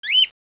CHIRP

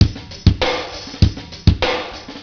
DRUMS

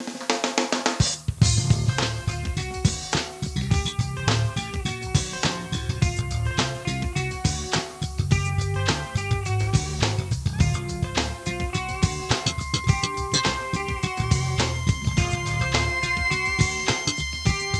sax

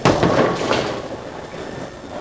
firework

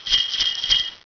AUTO

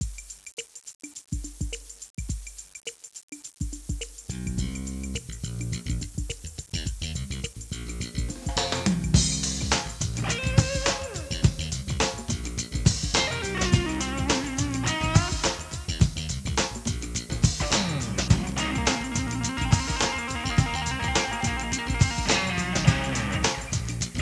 GTR

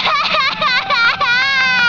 wrong

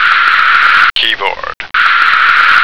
keyboard

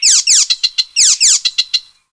BIRD

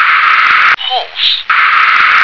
horse

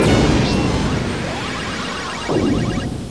boom; Tron3d

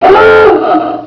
HORN; The SoundBlaster Multi Media Experience vol 4

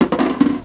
DROP

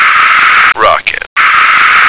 rocket

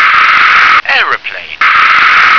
aeroplane

